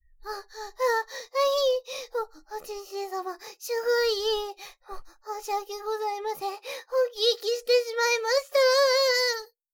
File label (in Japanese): バイノーラル はぁっ はぁっ あひぃ…